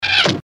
bullet swish and impact; swooshes-swishes

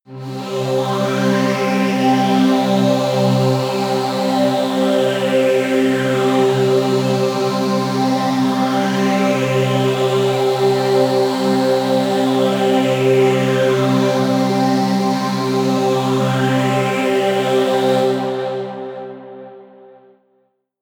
pad sweep bright; flaechen

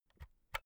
pull out usb b; objekte; elektronik

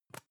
plastic can lid screw open soft; objekte; dose

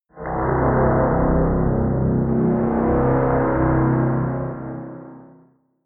roaring drone

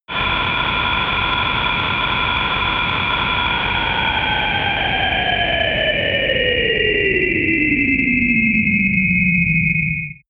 eerie wind scream fall, drones; musikelemente

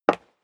step high heels wood parquet single left; schritte

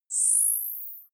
zoom out, signale

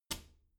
bathroom cabinet door close soft

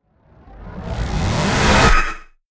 whoosh stutter; swooshes-swishes

